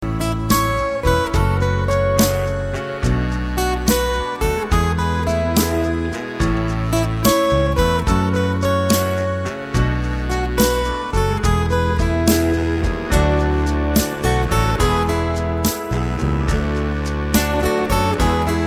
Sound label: nice guitar tone; Country